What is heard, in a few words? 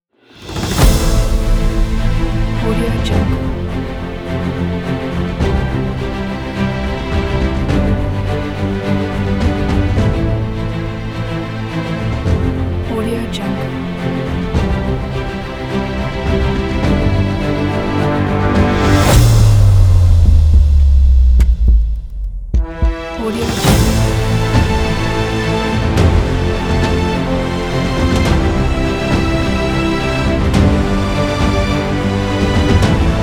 epic cinematic music